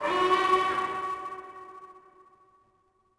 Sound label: howl echo; tusken; Star Wars Obi-Wan; XBOX